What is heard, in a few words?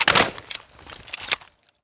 grapple